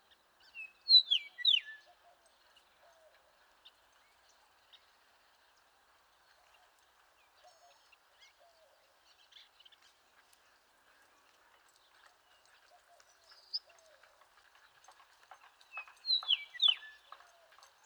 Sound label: Eastern meadowlark